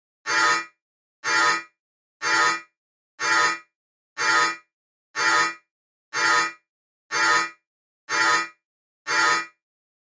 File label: Alarm Corridor Alar